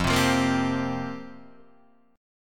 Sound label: F Suspended 2nd Suspended 4th F down Guitar Half Step Down